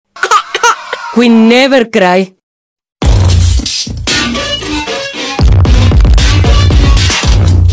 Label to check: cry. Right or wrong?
Right